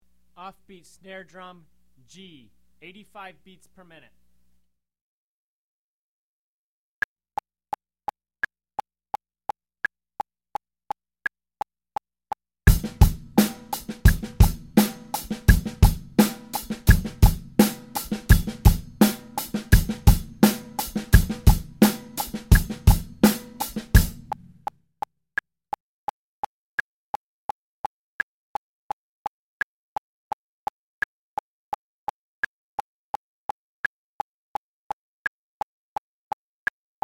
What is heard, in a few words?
off beat snare drum; Drum Lessons